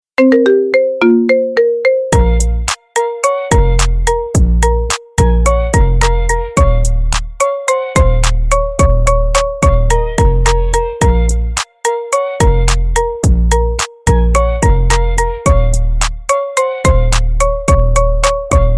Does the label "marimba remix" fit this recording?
Yes